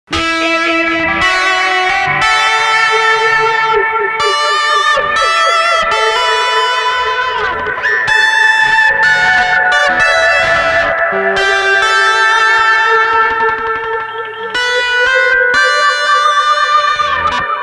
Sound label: Kaoss